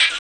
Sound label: RSS, 160 BPM